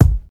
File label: mid heavy bass drum one shot a key, Royality free kick sound tuned to the A note